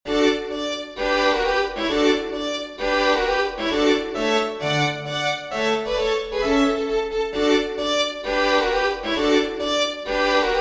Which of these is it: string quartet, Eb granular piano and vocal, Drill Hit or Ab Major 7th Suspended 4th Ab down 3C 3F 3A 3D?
string quartet